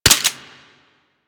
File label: Fire Mech, Weapons; Warframe; Boar